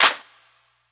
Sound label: long clap single hit D key; single hits one shots